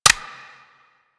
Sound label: Dex Furis Mech A; Tenno